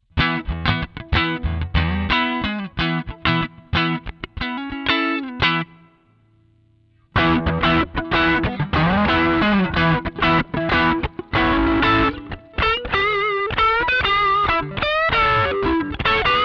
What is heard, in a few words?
Clipping Diode sound overdrive